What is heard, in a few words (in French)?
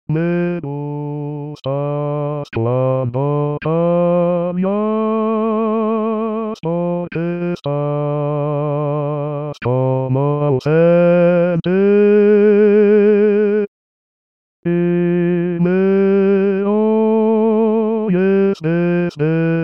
Basse; Neruda